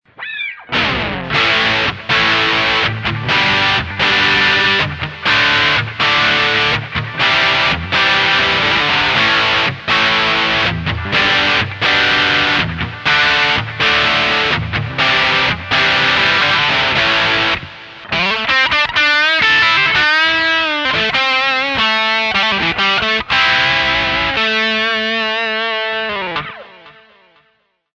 bridge dirty; rolling mill2

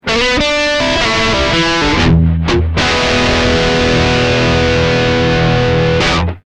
dirty neck coil 1 2; smoke stack ii

